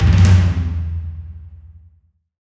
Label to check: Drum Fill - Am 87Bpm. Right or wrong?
Right